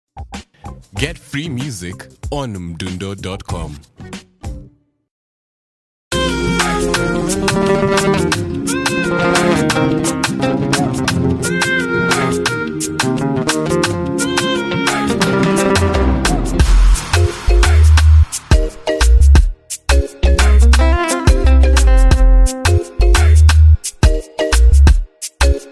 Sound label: Drill Beat